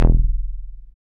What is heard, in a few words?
Dance Bass; Sound & Vision - Gigapack II CD 1